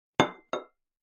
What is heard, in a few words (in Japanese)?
受け皿にティーカップを置く音